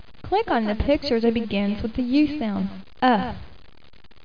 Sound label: UHH